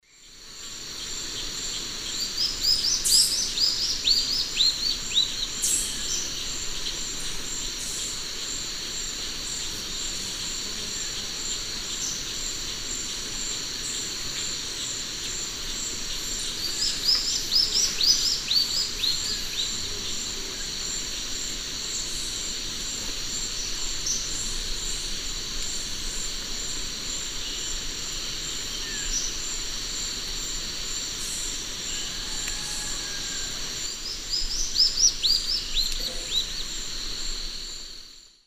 Hylophylax naevius, terra firme, song; S. America; Eastern Lowlands

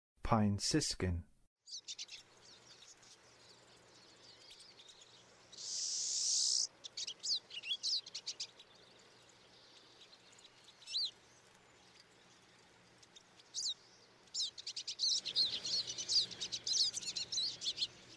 Pine Siskin, Western USA Songbirds; North America

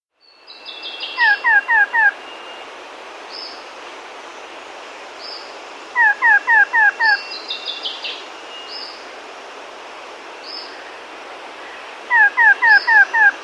Variable Antshrike; S. America

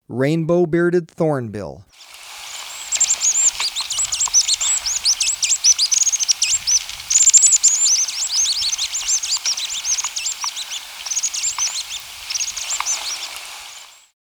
Rainbow-Bearded Thornbill; S. America

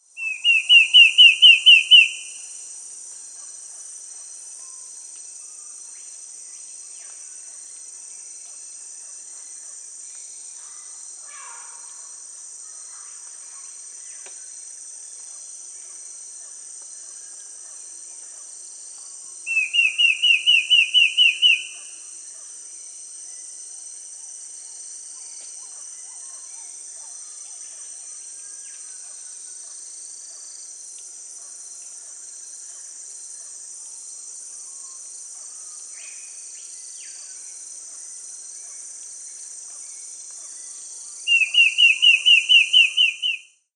Sooty Antbird (Myrmeciza fortis), Peru; S. America

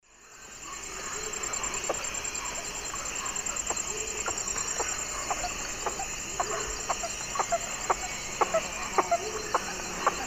Jacana jacana, calls; S. America; Eastern Lowlands

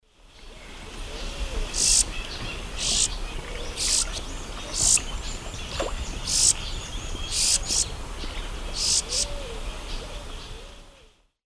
Thlypopsis sordida, calls; S. America; Eastern Lowlands

